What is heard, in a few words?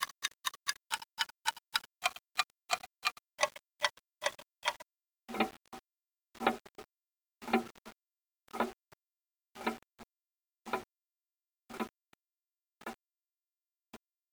Time Tick; Learn to Fly 3